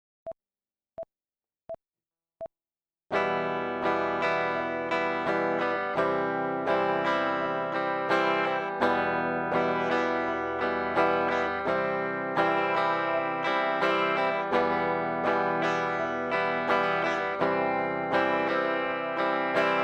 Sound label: Em to C, guitar